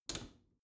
bathroom cabinet close soft; objekte; tueren

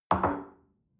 espresso place on table soft, restaurant cafe

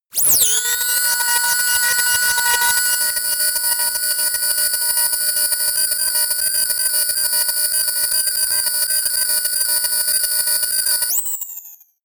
sci fi swell evolve; atmosphaeren umwelt

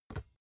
basketball catch light; sport-freizeit

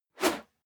whoosh whistle; swooshes-swishes

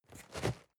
cardboard box medium shake single; objekte

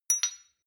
shot glass clink bounce medium; soundpool

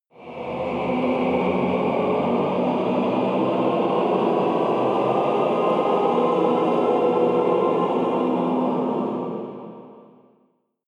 evil choir cluster up, risers

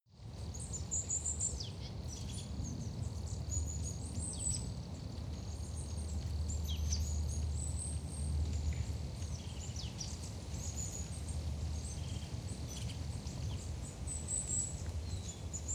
wind rustle birds plane insect, wald

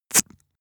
spit; soundpool

